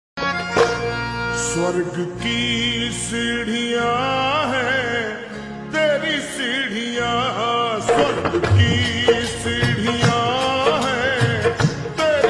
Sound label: Slowed Reverb Ringtone